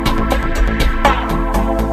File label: ster